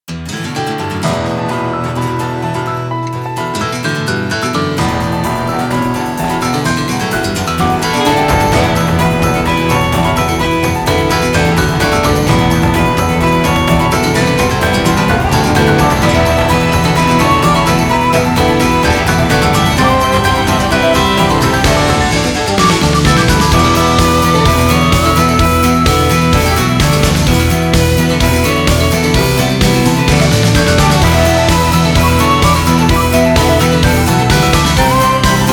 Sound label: Swamp